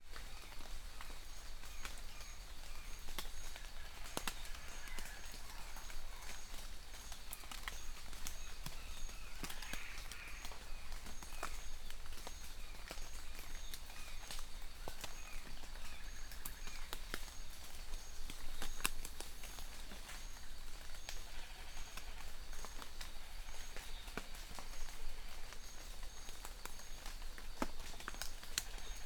nature forest ambience light rain birds in distance mexico; felix blume